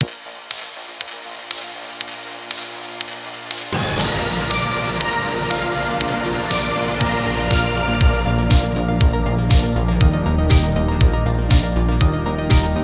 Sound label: ITALO STYLE